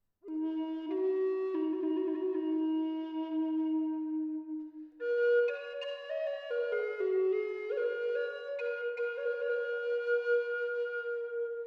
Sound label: Bubinga E